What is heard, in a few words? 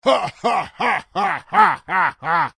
Emote Laugh Barbarian